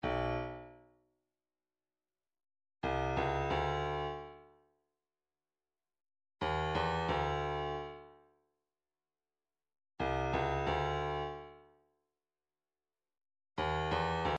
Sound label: 134 accent snare; Rabid